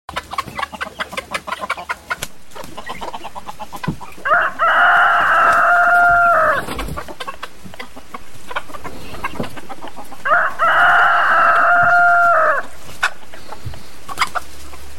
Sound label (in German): Hühnerstimmen